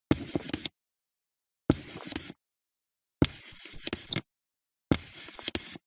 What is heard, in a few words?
Handwriting Whiteboard Marker Broad Point Short; D&H; soundbox library